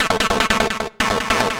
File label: Atonaloop, Industrial Analog